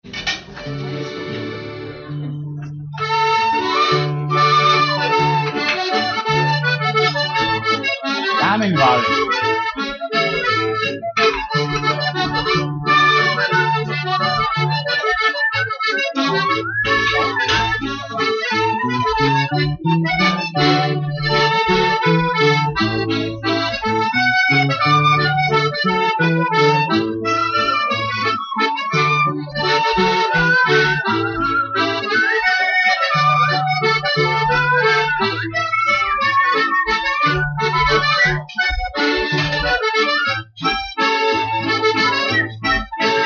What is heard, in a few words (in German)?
Walzer